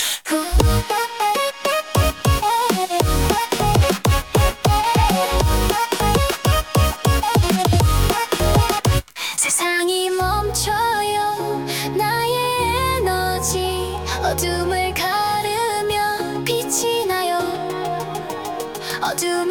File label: hyper edm; acestep